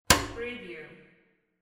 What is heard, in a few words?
metal switch